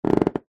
household bed mattress sit on creak; zapsplat

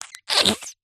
cartoon pull squeeze wet slime

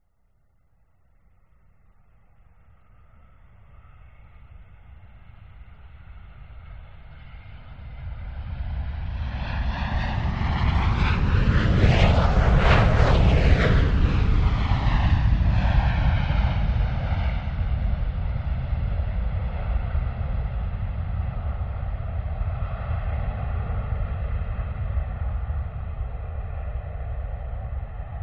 vehicles aircraft private jet land distance

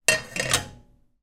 frying pan place down on stove

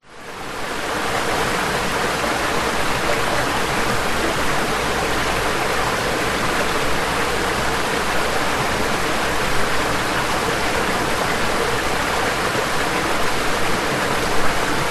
nature creek river forest close up

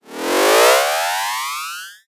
cartoon grow taller; zapsplat

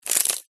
nature ice thin crack

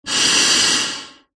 human blowing air into plastic tube

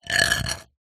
wood pole scrape hard ground; foley; zapsplat

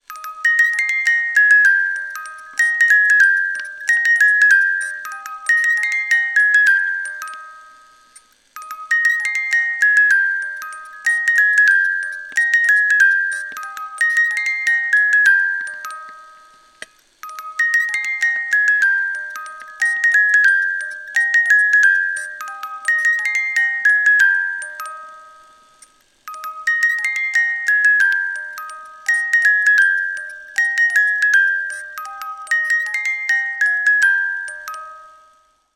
toy chime twinkle twinkle little star music box

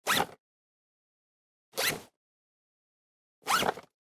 Backpack, zip; lilesound

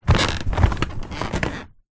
household mattress old springs squeaky movement; zapsplat

